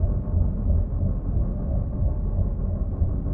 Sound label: ion cannon windup; apocalypse